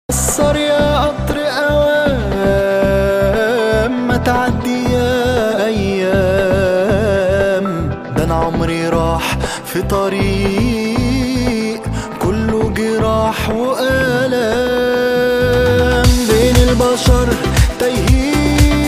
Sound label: Ringtone